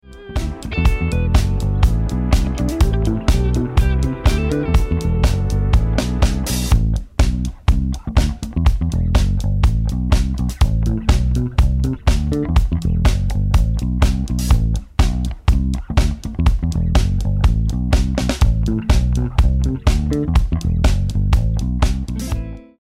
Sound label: Disco Yes 123BPM G